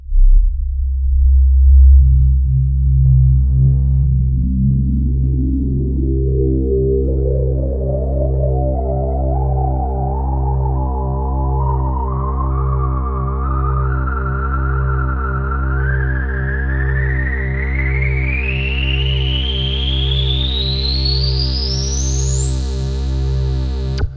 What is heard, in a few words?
FX SWEEP